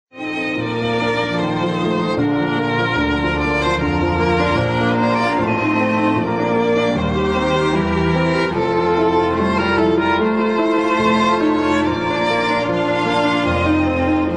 Piano Quartet; Beethoven

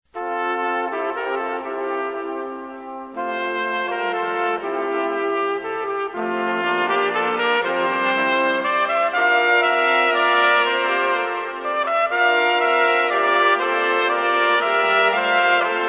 Brass, First Noel

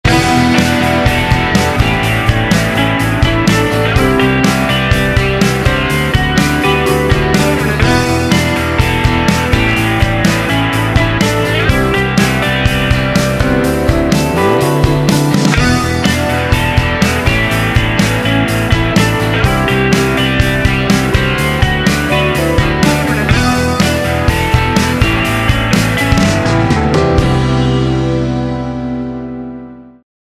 Pop Rock

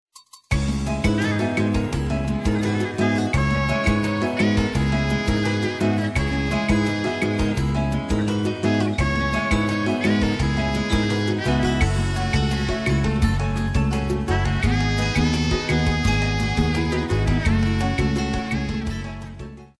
tropical

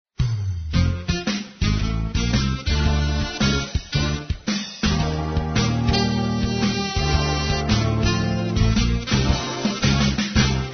jazz smooth

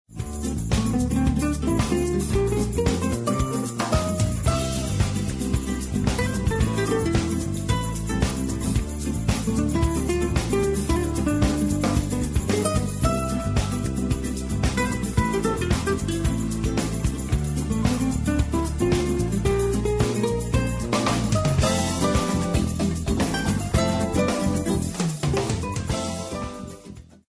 jazz smooth

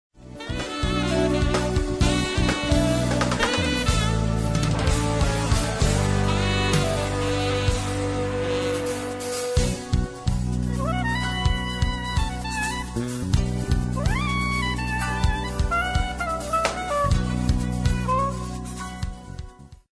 jazz pop

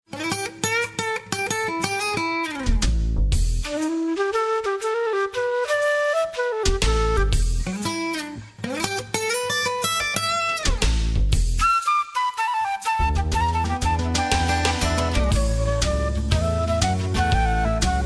blues

